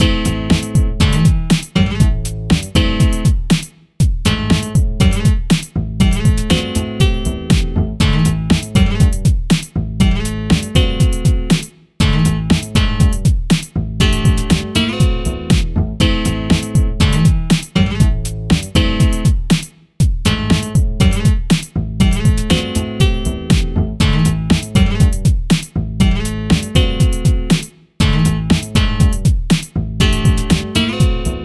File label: Pop Music Hip Hop Style Loop